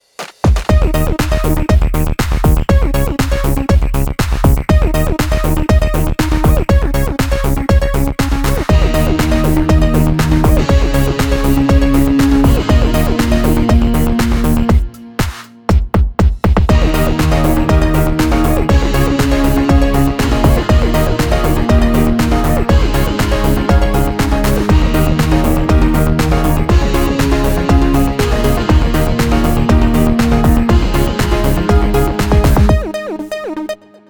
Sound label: Electronic, EDM; 리듬